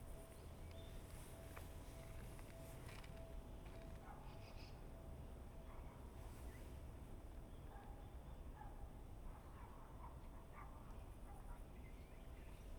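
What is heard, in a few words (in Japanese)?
Binaural, 電車通過; RODE NT-SF1をバイノーラルに変換し; ZOOM F6 MultiTrack Field Recorder; サウンドフォージでノーマライズしました。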